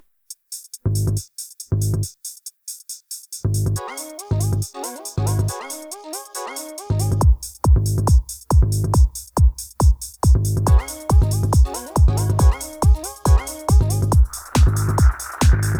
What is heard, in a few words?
Applause